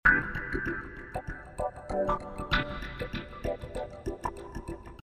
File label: Swinglefunk C